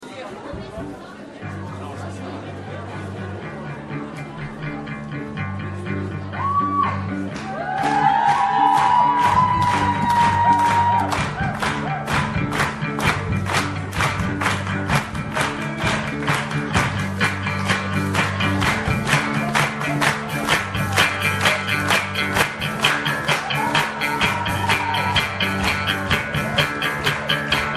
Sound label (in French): concert ambiance; live au miroirs